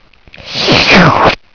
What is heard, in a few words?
Slurp